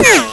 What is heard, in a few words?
GUN; Death from Above